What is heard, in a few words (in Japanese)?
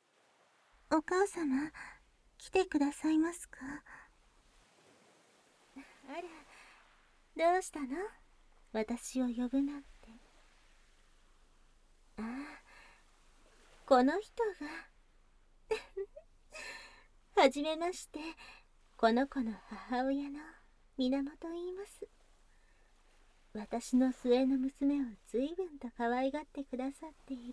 泡解け耳舐め